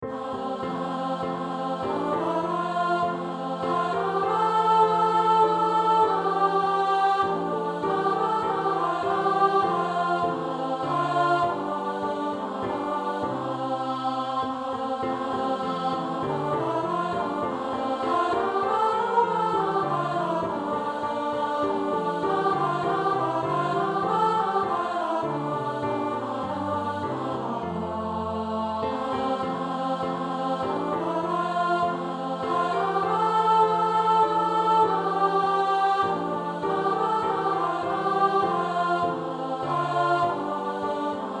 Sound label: Away in a manger Alto